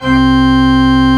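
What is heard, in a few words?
Church Organ C6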